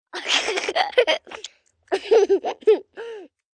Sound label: Laugh Giggle Wet, Laugh, 1 child, 4 yrs, short, silly giggle, chewing gum, human voice, kid